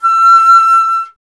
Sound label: flute E; Miroslav Vitous - Solo Instruments VOL-2; Solo lnst. II